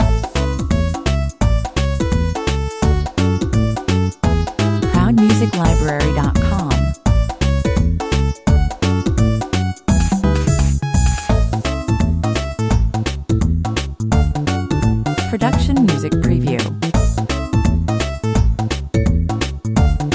Track for a computer, internet flash arcade video game. Reminds 8bit style games, 85 bpm